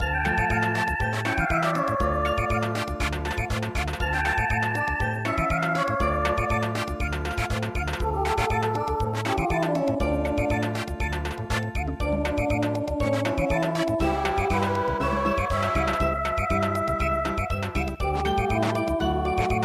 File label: Samba, in d Moll; Toccata und Fuge